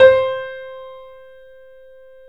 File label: BPM L4 C, The Piano; InVision Interactive